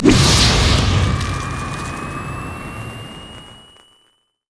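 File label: armadillo power ball; rammus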